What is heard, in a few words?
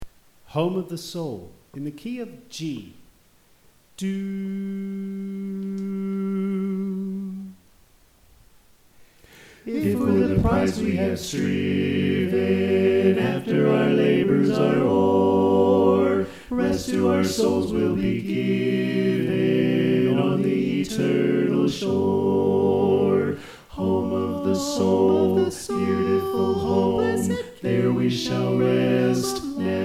Tenor Left